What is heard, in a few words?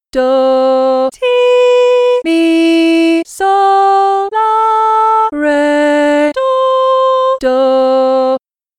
Medium Melody Solfa